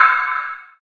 DMA XD-5, DIGITAL; 90 s Sample CDs; Sound & Vision - Gigapack I CD 1 (Roland)

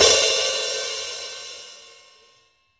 HH OP, Drum Dry; East Collexion; Drum 1

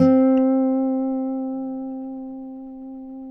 Finger C 4 L, Guitars; Spectrasonics; Hans Zimmer Guitars VOL-1

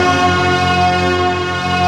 HIT ETHNIC; AMG - Now CD-ROM (Roland)

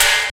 SNR, Tekno Industrial; East West

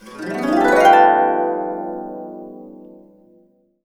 A, Harps; USB Soundscan vol.62; AKAI

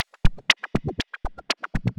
AO 120, Dance Grooves; Best Service; Dance Mega Add On Grooves

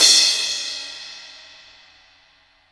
MIX CRASH, JAZZ

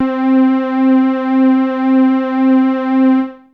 303-Style single hits

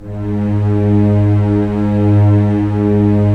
STR, Roland LA Composer; Roland - LA Composer vol. 4; Full Mutes

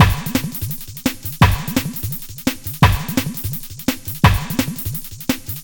beat, 85bpm

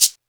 TR-727 KIT; Rubber Chicken Software Sample Bank; DRUMS + PERC

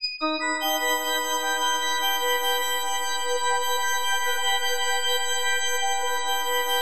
FM D# AUG; Bolder Sounds

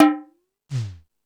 More Toms, E-MU Pro-Cussion

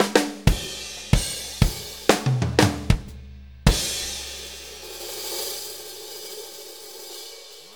Blues Fill; Sonic Foundry (Sony Creative Software); Loops & Samples Drum Tools; Fills

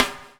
BUBL; Spectrasonics - Backbeat

